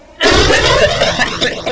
cough w echo

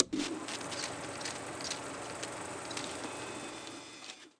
menu antique projector

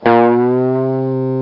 guitar bent

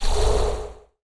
Sfx Block Hologram

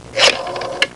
dialing a phone

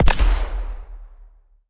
block regular explode

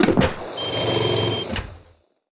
pickup whirlybird trigger

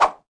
sfx grenade toss

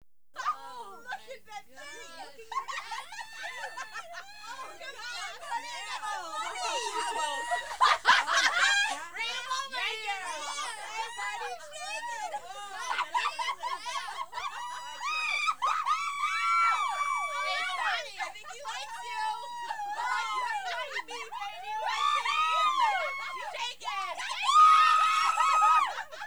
laughter female party screams

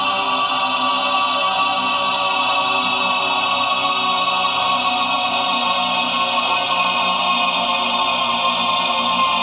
dramatic chorus intro

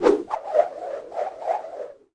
boomerang throw

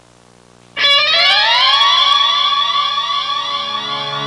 fading guitar intro

